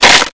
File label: Bullet Hits Wood Crac